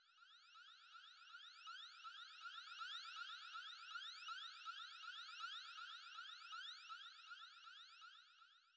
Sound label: siren; SciFi Warehouse Kit; ProjectZ